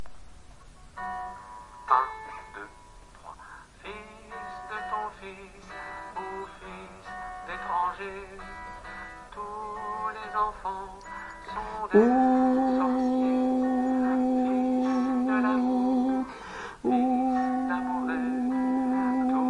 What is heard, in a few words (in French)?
sopranes, chorale